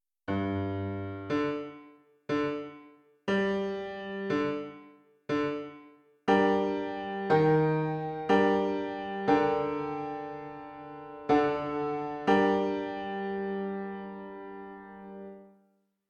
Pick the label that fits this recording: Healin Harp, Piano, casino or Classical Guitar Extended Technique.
Piano